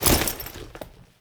Foley Step; Warframe; Kahl

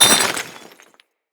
Melee Impact Cement

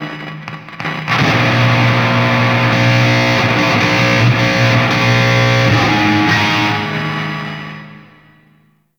Stadium Sound Check Guitars; Warframe; Ambience; Nineteen Ninety Nine

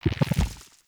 Nunchuck Pulse; Warframe; Infested

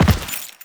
Land Default; Enemies; Footsteps; Warframe; Teshin

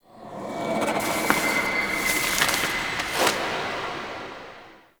Echoes Paper Scribble; Warframe; Ambience; Entrati

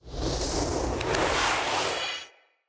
Staff Caster Charge Heavy; Weapons; Staves; Starter Staff Caster

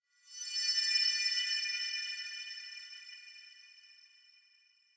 Clan Dojo High Baoding; Warframe; Dojo Randoms; Ambience

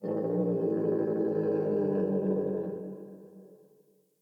Forest Open Glade Creature Far; Day

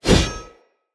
Whoosh, Swords

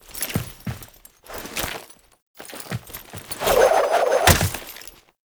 Idle Agile Speargun; Warframe; Dagath

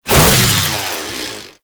Chain Saw Tonfa Whoosh; Warframe; Grineer

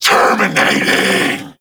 Vocal Attack; Warframe; Duviri; Dax

